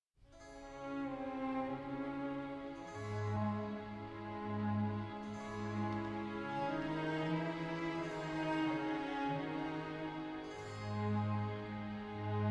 Violin Concerto No. 2 in E Major II. Adagio; Bach, JS; BWV 1042

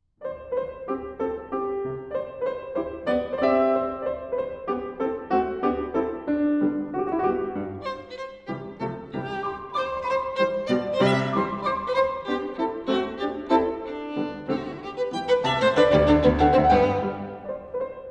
Violin Sonata in C Minor, Scherzo, Allegro - Trio; Violin Sonata No. 7; Op. 30 No. 2 III.

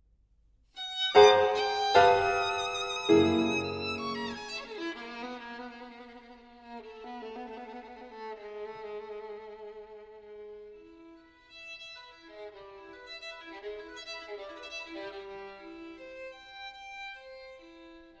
Violon Sonata in G Minor, Intermède

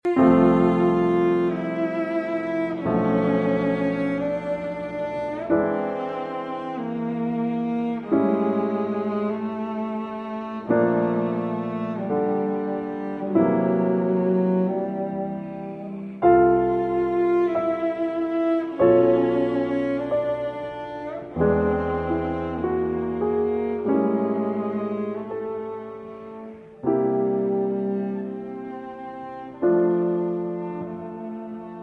Sonata for Cello and Piano; III. Chorale

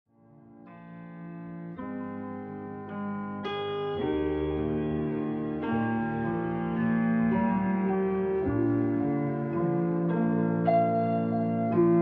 Relaxed Piano Music, Tranquility Escape

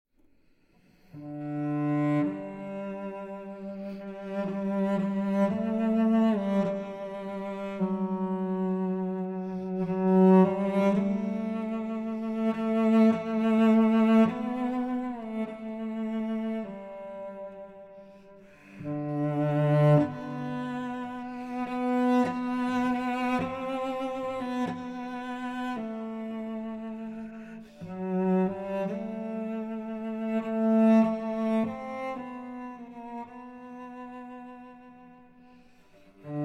Arr. For Solo Cello

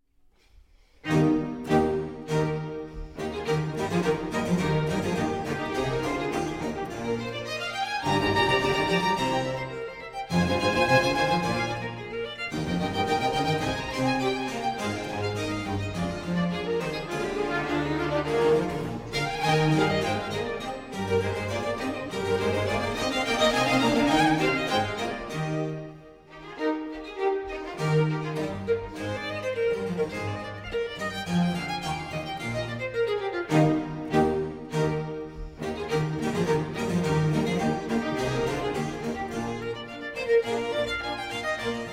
Violin Concerto in E Major, Allegro; BWV 1042

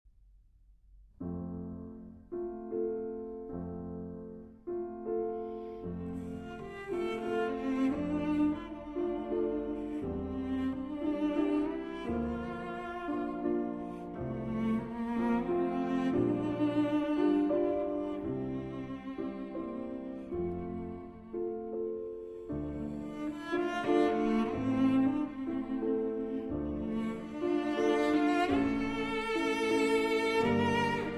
Romance in F Minor

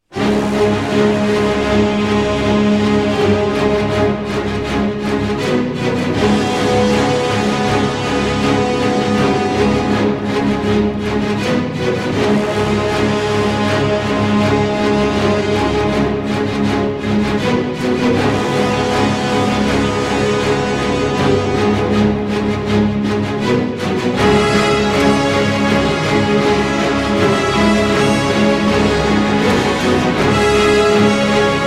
Orchestral

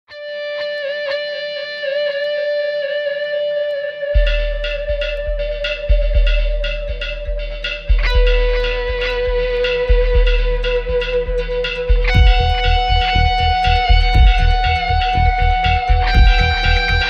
Instrumental Version

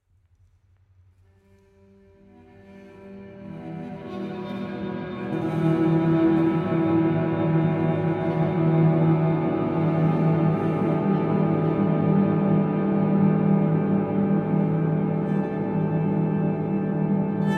Cello Ambient Improvisation

